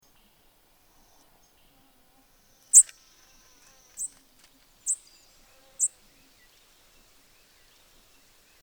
Black-and-white Warbler Mniotilta varia; warblers

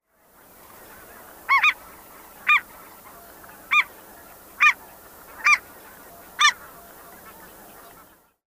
Ross's Goose Anser rossii; waterfowl

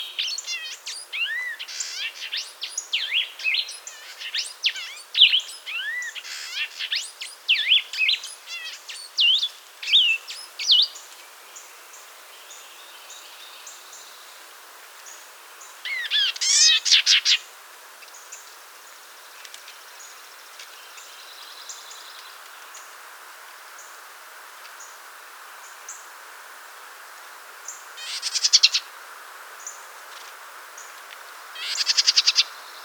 Blue-headed Vireo, Vireo solitarius

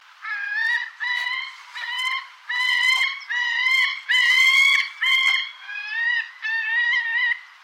Gyrfalcon, Falco rusticolus; raptors

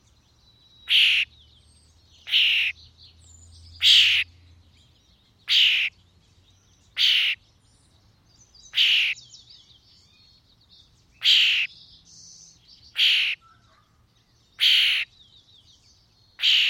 European Starling, Sturnus vulgaris; blackbirds orioles

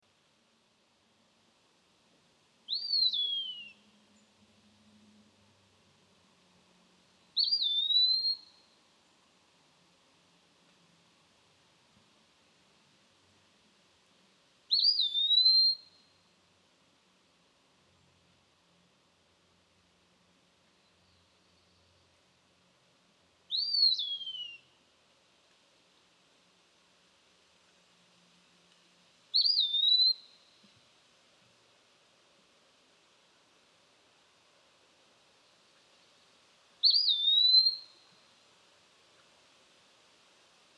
Eastern Wood Pewee, Contopus virens; flycatchers

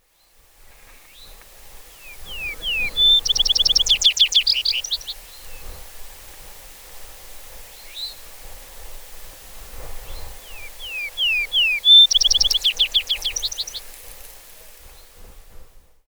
Vesper Sparrow Pooecetes gramineus; sparrows

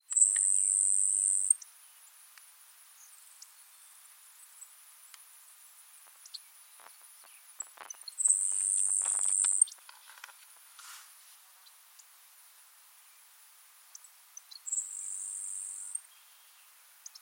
Grasshopper Sparrow Ammodramus savannarum; sparrows

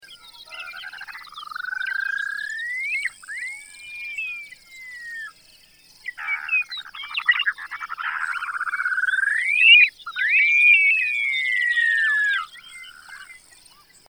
Upland Sandpiper, Bartramia longicauda; shorebirds rails

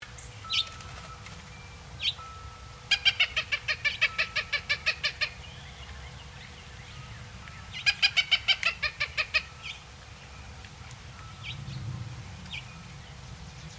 Blue Jay, Cyanocitta cristata; jays and crows

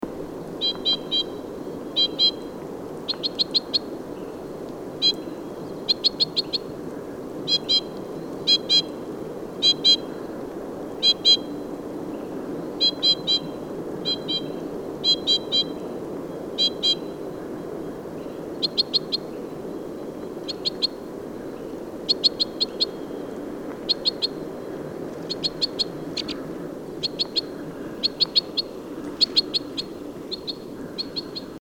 White-winged Crossbill, Loxia leucoptera; finches

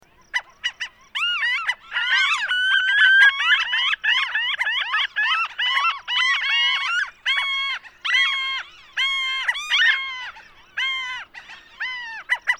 Franklins Gull, Leucophaeus pipixcan; gulls terns

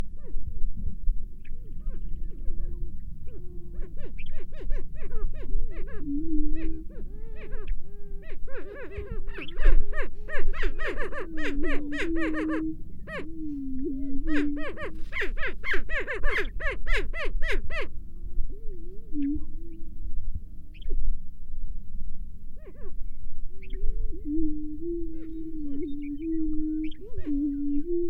Greater Prairie Chicken, Tympanuchus cupido; gamebirds

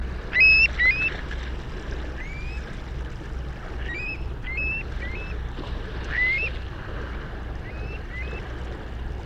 Long-billed Curlew, Numenius americanus; shorebirds rails

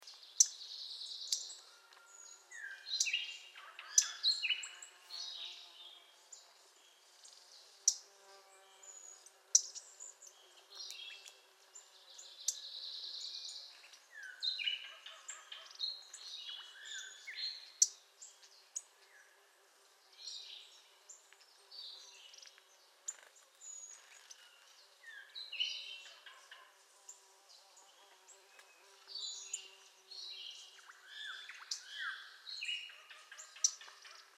MacGillivrays Warbler, Geothlypis tolmiei; warblers

